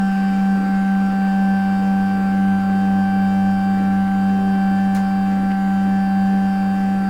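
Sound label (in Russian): сканер документов сканирование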